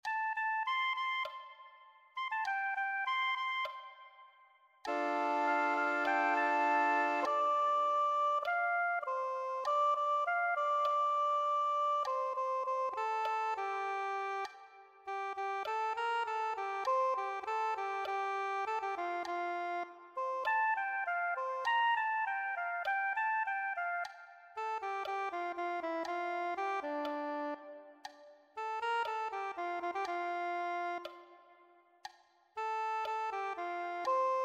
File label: soprano saxophone